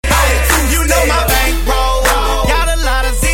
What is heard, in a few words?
2step Message Ring